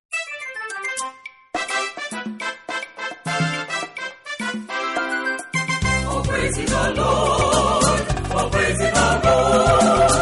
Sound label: carib voices